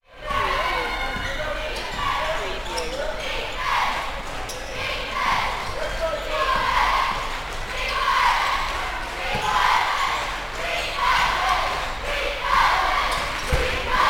Basketball Cheerleaders Chanting

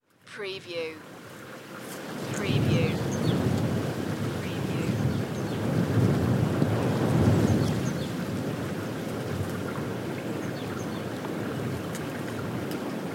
Windy Forest

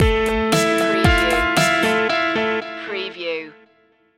streams of light stinger

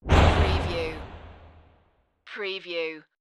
Wipe

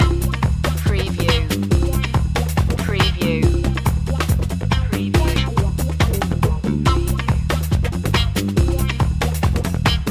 leslie jumping loop

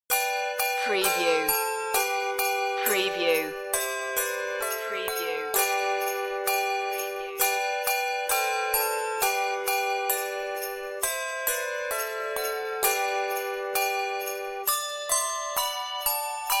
handbells sleigh; good king wenceslas

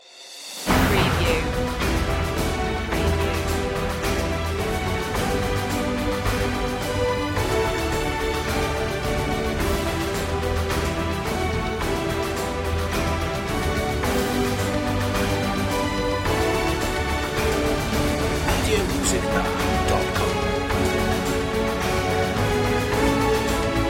no choir

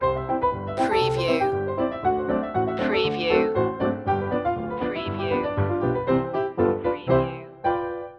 old saloon loop

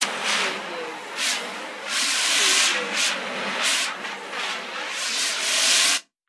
Fire Extinguisher

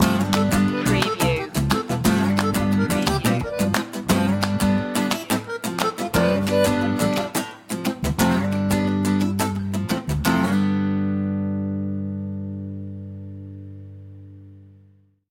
creole family warm up

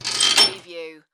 Chain Metal Clanging

